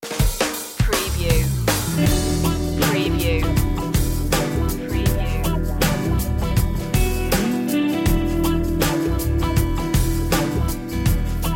smooth blues

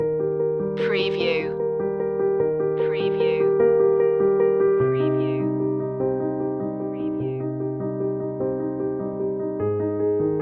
intimate piano loop

